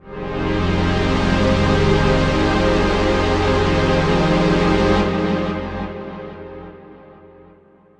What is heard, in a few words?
pad troit F